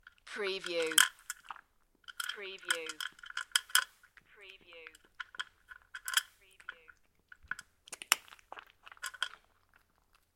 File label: Cat crunching biscuits and chewing; beanstalk audio